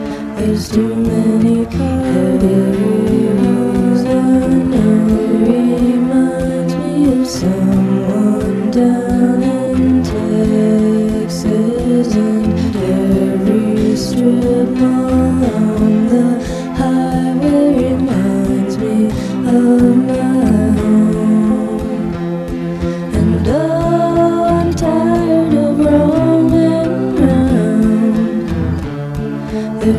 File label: cars and parties mixed